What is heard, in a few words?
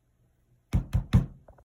toc